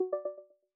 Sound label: Liveness Complete; iOS 26 Sounds